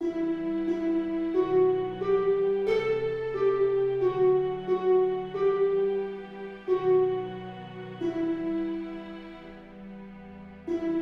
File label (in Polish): melodia